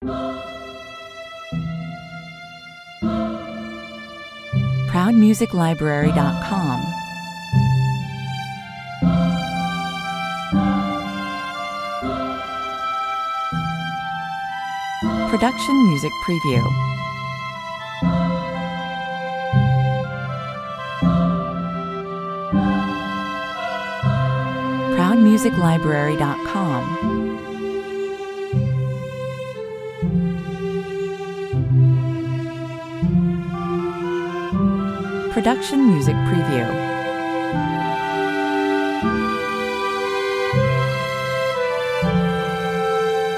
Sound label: soft n classical 120 bpm, Mittelalterliches Rondo der ganz sanften Art